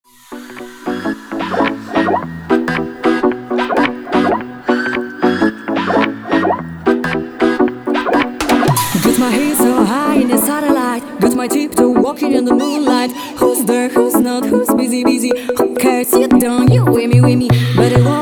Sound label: Jazz